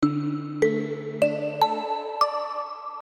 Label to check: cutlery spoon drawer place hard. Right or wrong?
Wrong